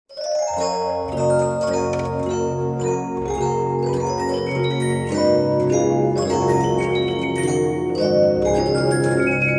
music box scary; fnaf

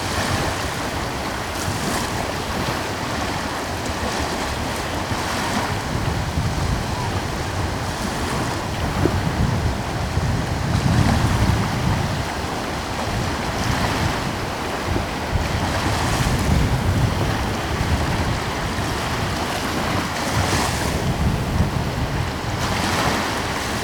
windy sea loop

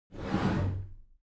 heavy sliding door

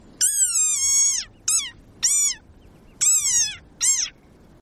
desert rain frog

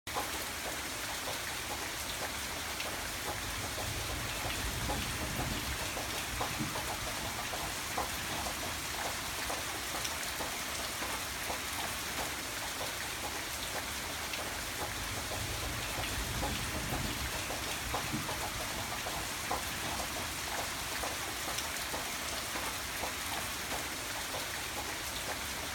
indoor hard rain sound

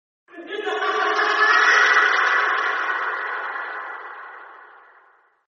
creepy laughter reverb

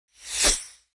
fast sweep transition

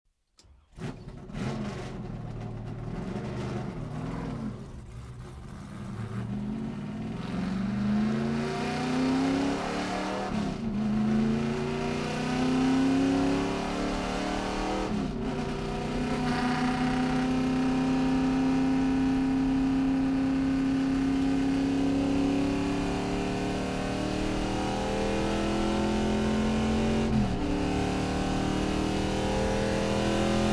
nascar driving at fast speed

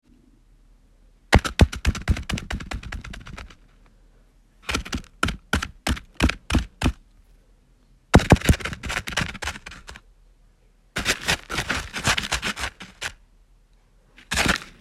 small animal running away

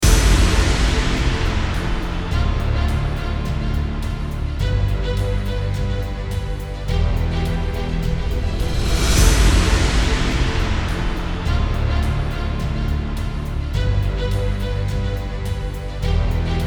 cinematic epic trailer loop

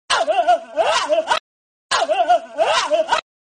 screaming bird; meme

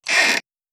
wooden floorboard creak

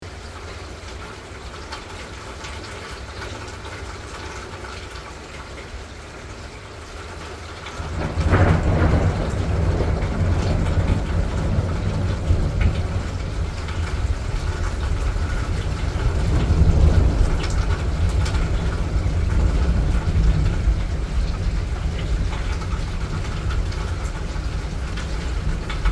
rain

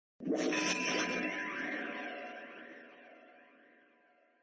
impact dial sound; one piece

